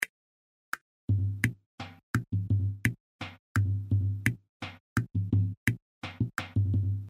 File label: LOOP